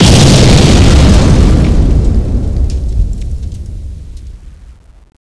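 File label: rocket explode, kraken; CSO KrakenBoss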